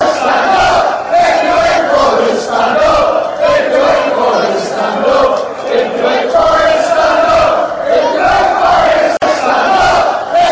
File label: stand if you hate forest, fanchants